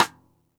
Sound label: xstick snares on; JazzFunkKit